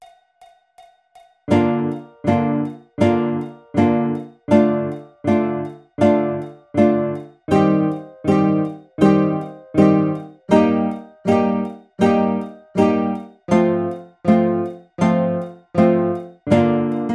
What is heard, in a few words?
Bb Major Arpeggiator Baritone; Ukulele; Baritone Key of Bb; Jumping Flea Arpeggiator: Gradus ad Parnassum for the High G Ukulele; Arpeggio #6